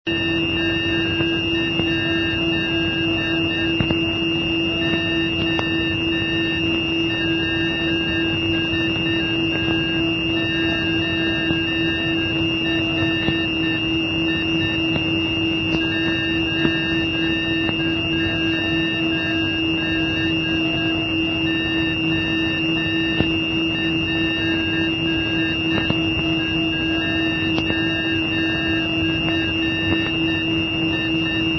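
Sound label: NDB non-directional beacon; VEN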